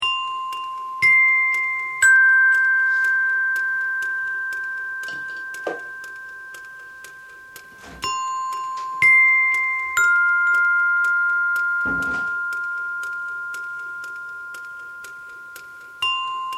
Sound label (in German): klingklang